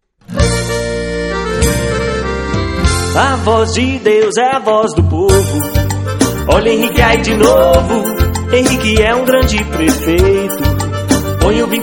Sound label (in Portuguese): Jingle Politico